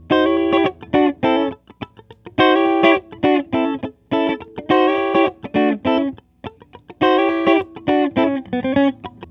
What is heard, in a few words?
FAITH GTR, Funk Gtr & Wah; PlatinumPhattCD2; E-MU Producer Series Vol. 8 – Platinum Phatt